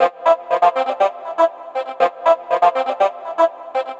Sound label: Progressive Synth, Trance